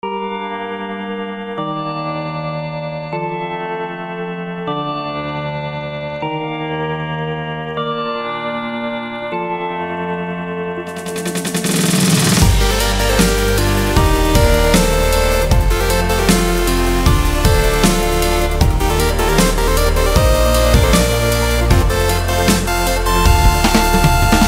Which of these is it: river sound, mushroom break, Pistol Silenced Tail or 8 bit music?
8 bit music